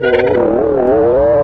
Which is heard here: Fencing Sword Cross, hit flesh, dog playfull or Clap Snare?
dog playfull